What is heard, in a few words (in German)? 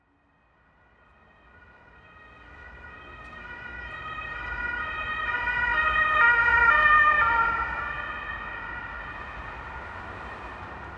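RETTUNG, Stadtgeräusche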